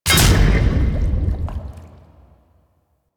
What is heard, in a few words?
Fishing Attack Impact; Duviri Maw Fishing